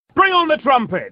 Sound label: Trumpets